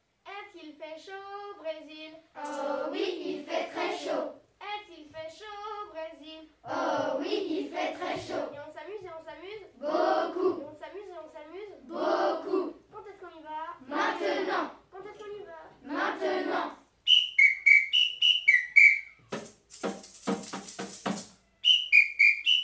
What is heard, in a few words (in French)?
chant instruments; appel o le le